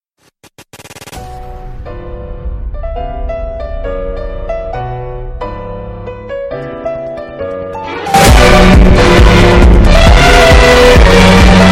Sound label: earrape